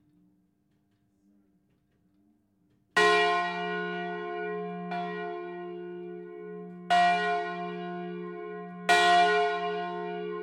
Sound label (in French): Lausanne cloche; campanologie